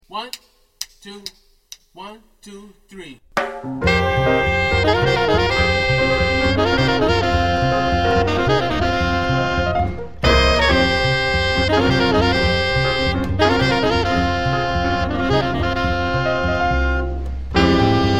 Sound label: minus drums